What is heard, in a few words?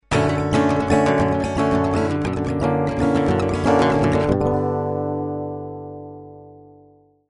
delrin